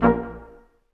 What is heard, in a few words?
BRS Quintet stac; Roland L-CD702; Quint.Stacc